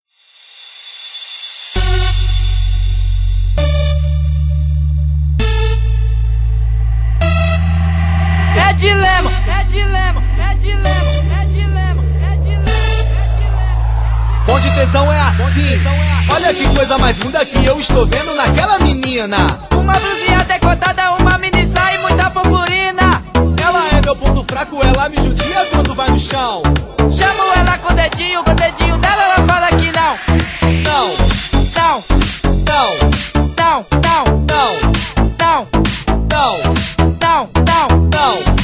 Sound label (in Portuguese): eletrofunk